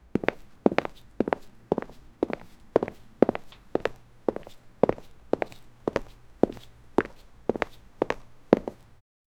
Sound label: foot step, shoes